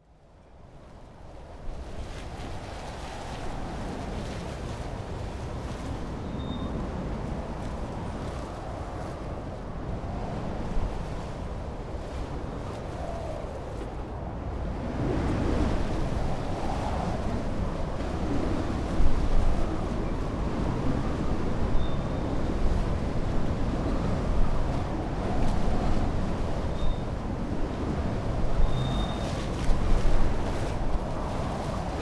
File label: flying container; In last week of August the first “real winter storm” arrived to Iceland, with strong cold